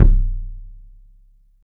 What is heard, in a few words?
THUD KICK; 3D Drums